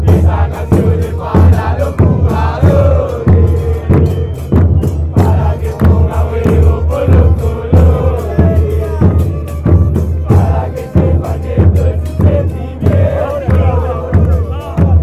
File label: chant, argentina; atlanta